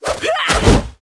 ArcDraconus F attack

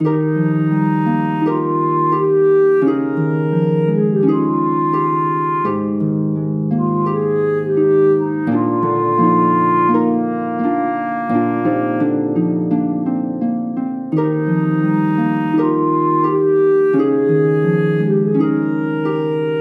Generic Dialogue; Valthirian Arc Hero School Story

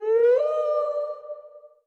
Cry; Folk Hero

